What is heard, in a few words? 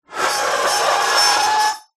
metal scrape on metal med harsh shrill